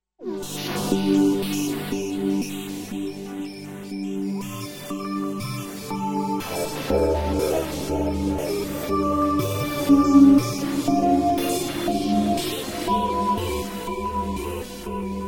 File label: pad, access virus; synth